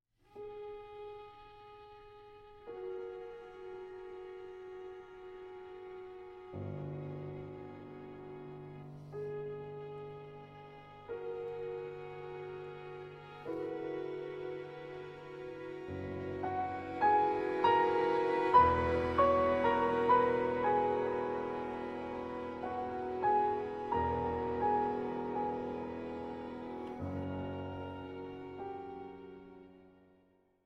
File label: sample piano trio dolcissimo; uscignolo; Ross Edwards (b.1943)